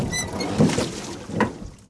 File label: row boat